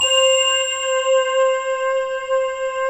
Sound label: LINEAR SYNTH